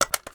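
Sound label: weapon foley assault rifle reload empty mag in; weapons; gmod; viper; scharlie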